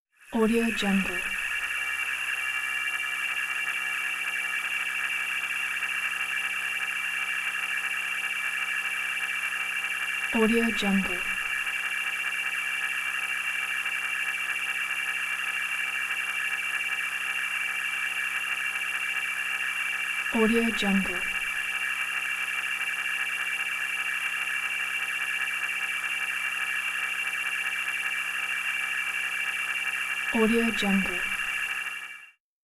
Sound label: scifi device harsh buzz; hollywoodedge